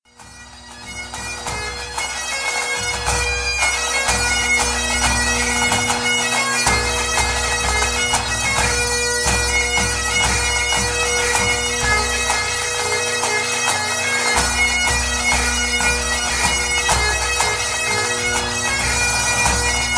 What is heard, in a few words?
Hornpipes